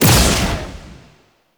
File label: Proj Hit; Warframe; Lizzie